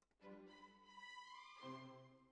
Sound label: Clarinet